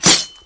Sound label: knife hit wall; Balisong1; knifes